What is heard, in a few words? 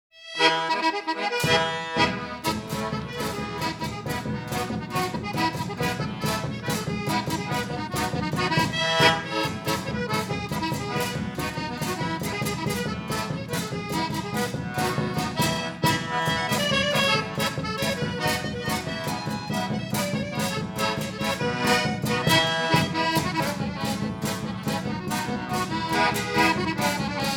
Polka; cyganeczka